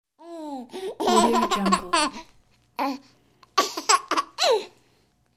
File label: baby laugh